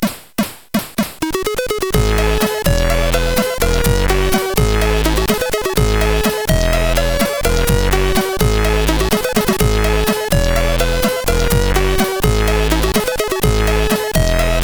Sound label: Mix, sid CSG8580R5